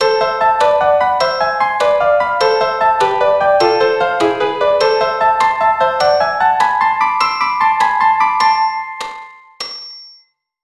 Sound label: Am melodic